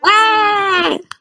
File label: yeaaaaaaaaahhhh